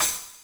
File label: CYMBAL, Yamaha RM 50